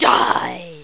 die

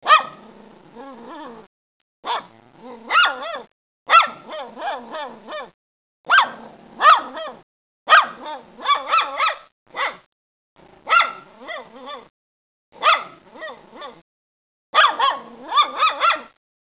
SCHNAZER; ANIMAIS

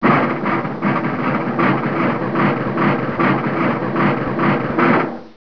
DRUMROLL

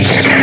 SPEEDUP; HIGHTECH

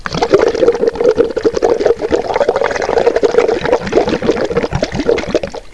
BLOWING, WATER

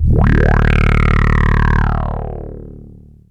MOOG

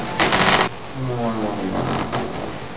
CREAK

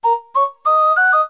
flute; amiga; Samplemanager

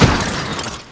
crash

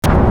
FX BOMB

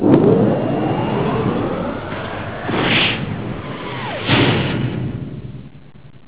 WARP

